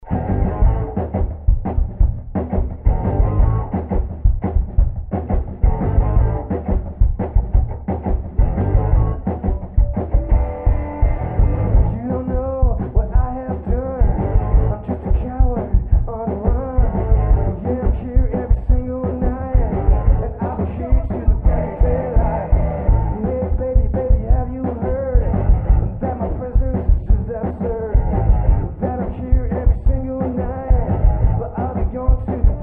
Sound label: sensorineural moderate